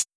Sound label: closed hat